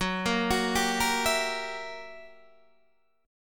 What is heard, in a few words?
Gb 7th Flat 9th Gb Guitar Open D